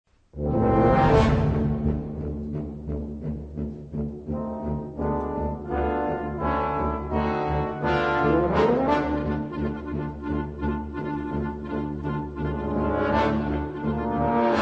Concertino for Tuba

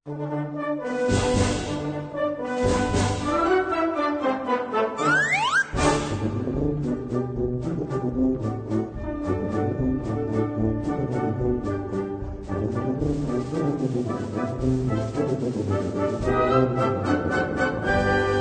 Tyrolean Tubas

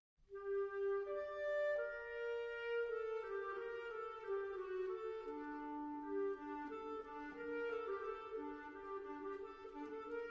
Little Fugue in g Minor

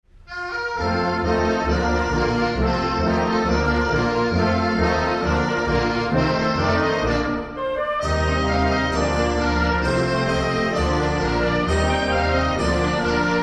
A Medley of Traditional Portugese Folk Tunes

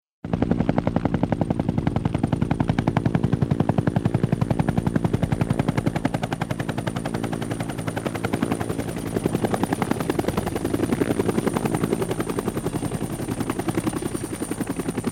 Helicopter

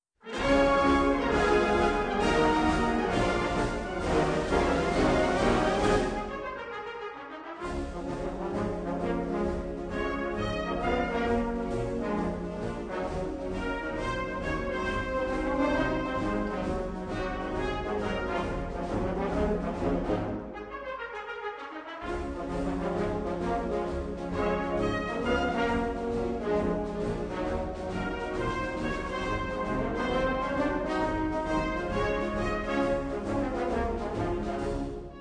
Brass Roots

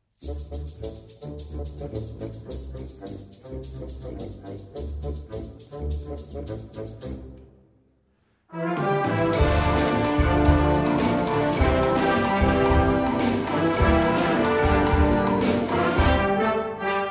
Bossa Nova Breakout

